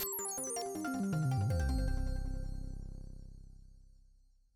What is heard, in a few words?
Forest Sting Reborn